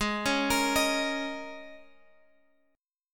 Ab Suspended 2nd Suspended 4th chord, Guitar, Open F tuning; Absus2sus4; arpeggiated; chordshape; 20,20,22,22,x,x